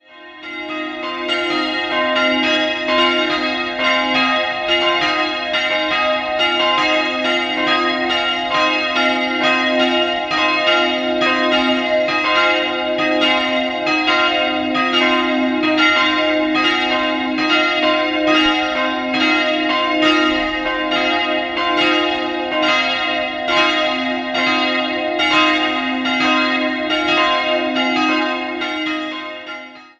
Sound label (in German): Neumarkt Holzheim, glocken